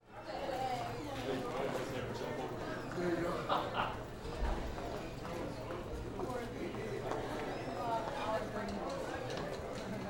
High School Hallway; Blastwave FX

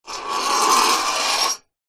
foley metal sharp scrape

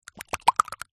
cartoon slime movement fast

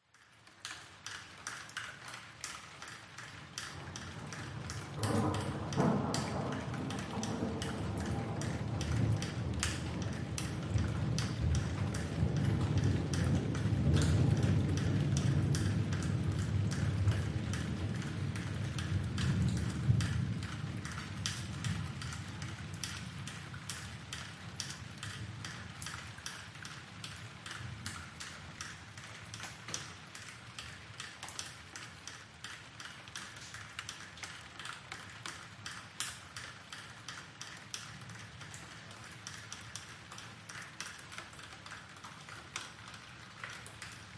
Rain Drippy Distant Thunder Rumble; ORTF; PCMD100; PMSFX

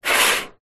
foley metal scrape large loud short

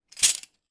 household cutlery handful in hand; zapsplat

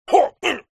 human fight karate vocalisation male

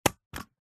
office stapler single staple into paper

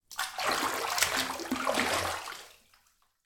household bath water movement

